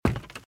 Footsteps - Wood - Creaky - Run - Left; RadwinIsland

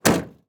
Semi Truck; Blastwave

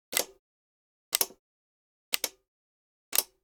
Generic Button Plastic Small

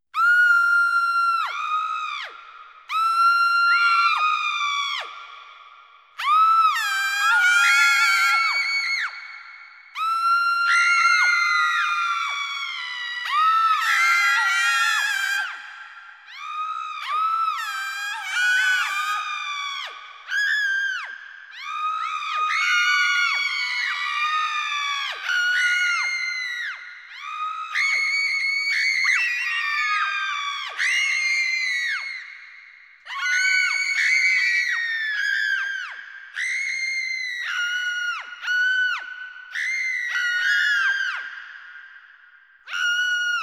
Woman in Dungeon; 1001 Sound Effects; Halloween Horror Sounds Effects

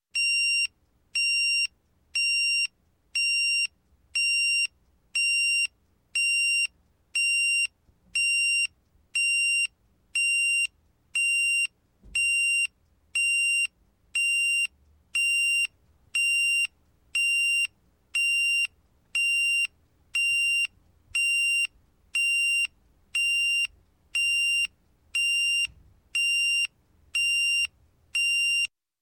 Clock, Alarm Electronic Alarm Beeping, Radio; Sound Ideas 2000 Series

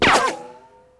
Gun, Ricochet, Whiplash; gun and weapon sounds; captain audio; fully loaded

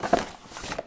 Cardboard Popcorn Box Open; SFX Kit; Foley - Misc

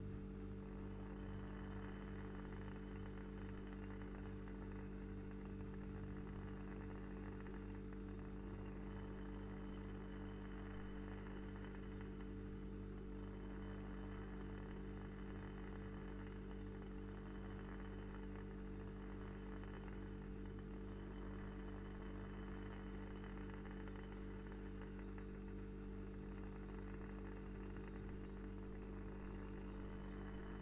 fridge; devices accessories utilities; NS Studios - ultra sound collection

